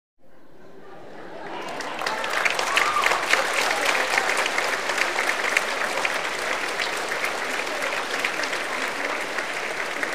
applause conversations of the crowd in the theater